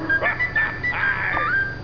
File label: flute